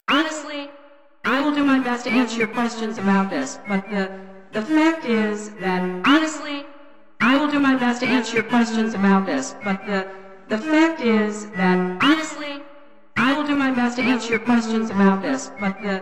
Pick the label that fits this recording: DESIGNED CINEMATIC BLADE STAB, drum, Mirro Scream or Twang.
drum